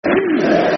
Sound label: Easy, Easy, Easy, Easy, Football Chant (Soccer Song); Doncaster Rovers Drfc